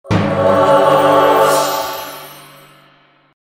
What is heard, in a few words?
Door Jingle; Asterix at the Olympic Games